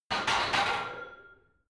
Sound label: barbell heavy plates replace rack reverby hard